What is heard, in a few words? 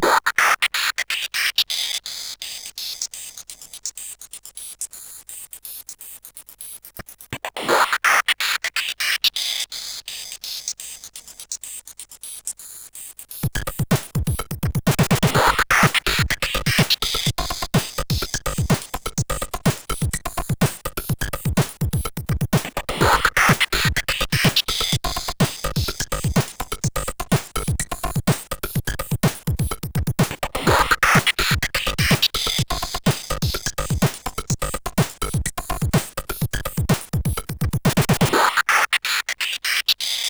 Drums, CSG8580R5